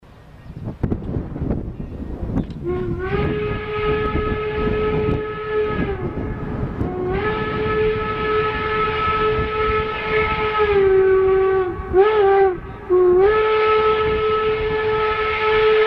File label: Early Single Note Hooter